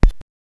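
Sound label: satisfying sound hitting; interaction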